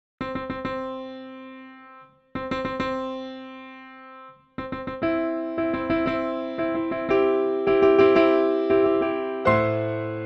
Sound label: Piano; Wedding March